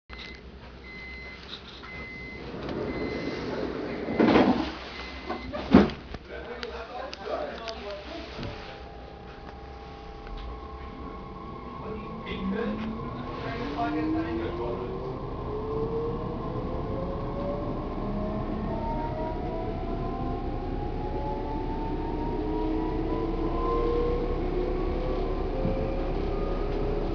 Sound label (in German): 422 Anfahrt; Bahn